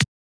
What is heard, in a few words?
melbourne bounce snare small; STYLE KITS; D3ron Melbourne Bounce Kit